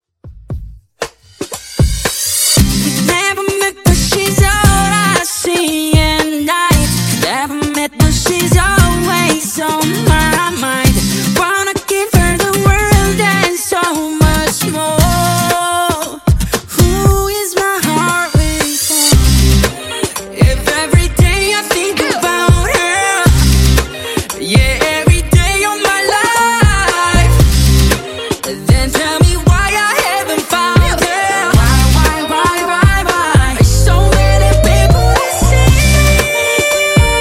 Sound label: CLEAN 116Bpm